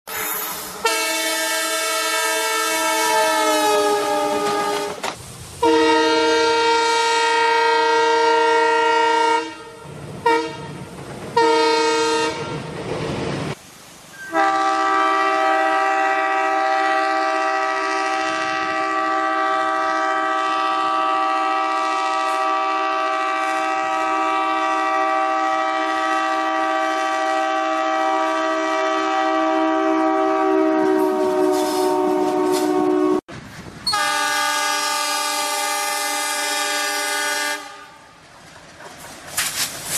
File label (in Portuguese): Buzina; Comboios